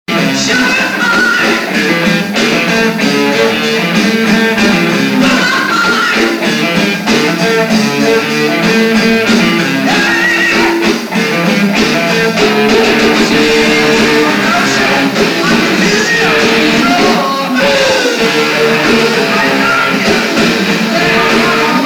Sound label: distorted ocean; ravenloft